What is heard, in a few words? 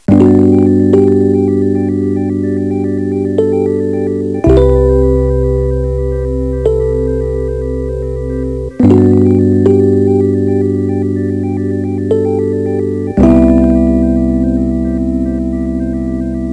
Quiz Loop; Visual Logic Training; NDS